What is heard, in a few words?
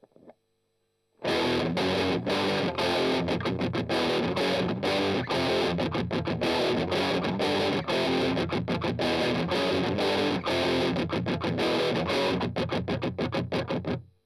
Strum Octav